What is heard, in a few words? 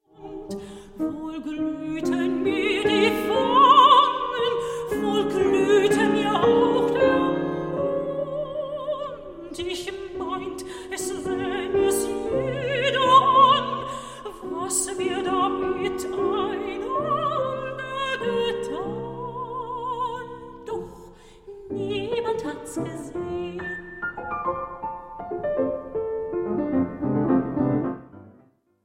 for voice and piano; richard strauss; begegnung av72